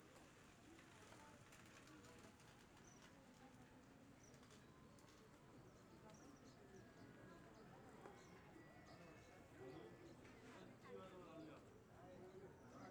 African Village Outside School Ambience Daytime Stereo Binaural